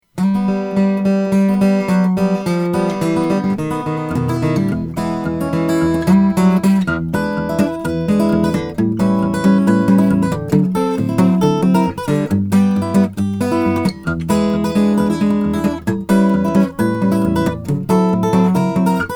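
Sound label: leach brazilian rosewood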